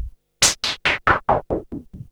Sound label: NOIS FX, THE ANALOG; Back In Time Records; Big Bang